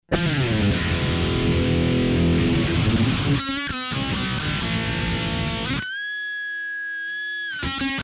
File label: Tube; TubeProfessional